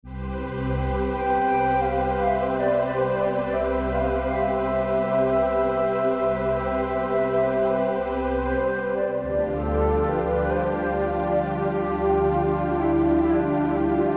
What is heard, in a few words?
Flute Concerto